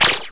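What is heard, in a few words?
shot sound; AGGRESS; 501 Great Games - Volume One; Guildhall Leisure Services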